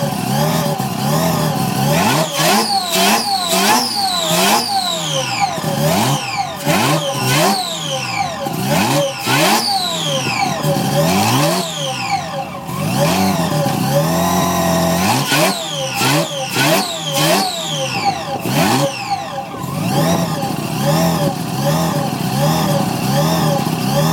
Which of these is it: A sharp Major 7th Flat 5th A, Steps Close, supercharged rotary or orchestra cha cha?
supercharged rotary